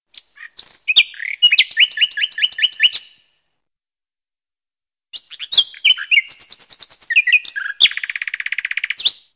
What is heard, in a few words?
long sweet chirping, peniya solovya